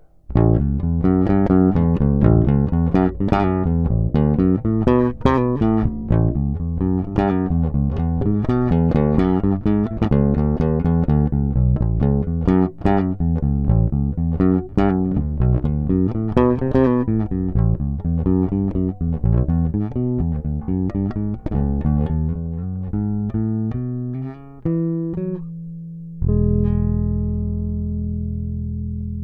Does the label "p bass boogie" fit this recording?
Yes